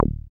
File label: SYN 303, Roland TB-303